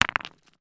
foley stone med drop down on other

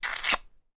human kissing

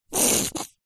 food chocolate sauce bottle squeeze squirt; zapsplat

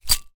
food crushed potato chips crisps drop in bowl; zapsplat

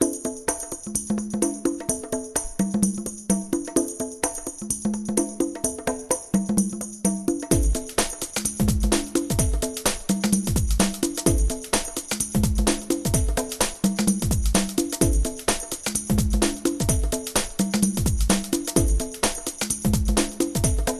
carnival vibes

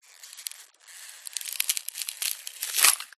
nature ice thin pressure squeaks then break

